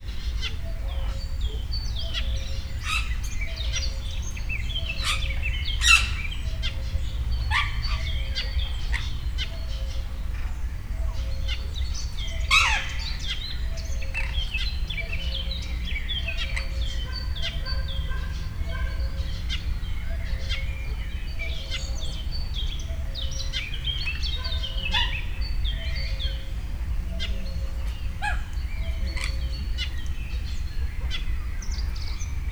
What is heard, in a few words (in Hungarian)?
kakukk is, sziklapapagáj; állathangok; miskolci zoo 2009